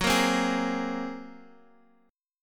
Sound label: A sharp 7th Suspended 2nd Sharp 5th